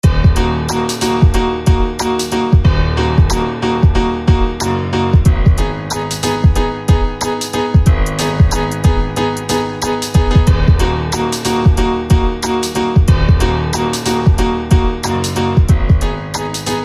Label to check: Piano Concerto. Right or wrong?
Right